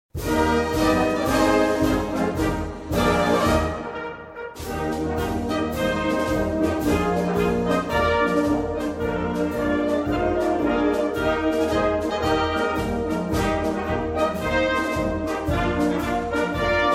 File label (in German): einzug der dorfmusik